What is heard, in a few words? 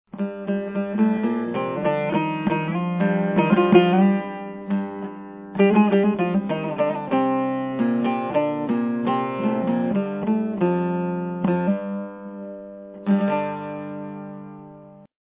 chogur